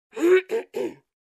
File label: Adult Female Clearing Throat